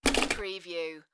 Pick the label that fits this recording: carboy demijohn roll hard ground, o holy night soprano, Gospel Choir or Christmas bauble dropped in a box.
Christmas bauble dropped in a box